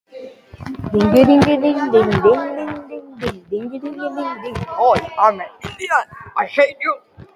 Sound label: ding ding